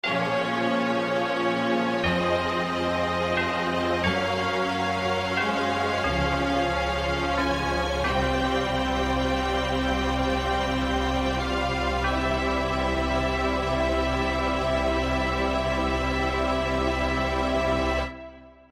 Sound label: Choir Strings; Front Mission Gun Hazard; Magnus Choir; Syntheway